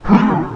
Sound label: attack; Jockergrimm1